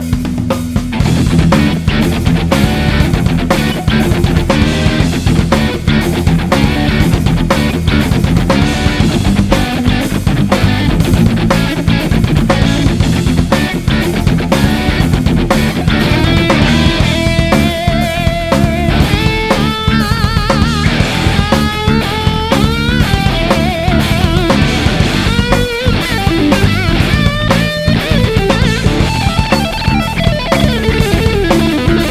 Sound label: AMP Funky; Funkiness (by Dimi); Studio Devil Amp Modeller Pro v.1.0